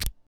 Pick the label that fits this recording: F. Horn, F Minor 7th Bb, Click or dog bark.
Click